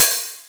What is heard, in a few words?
XV Hat O, Roland XV-5080